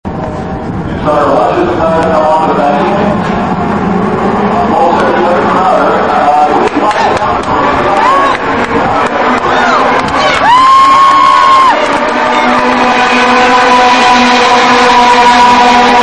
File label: Ferrari F355 challenge race start